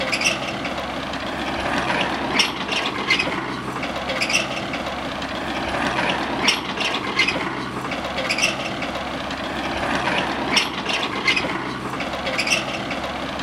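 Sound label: caterpillar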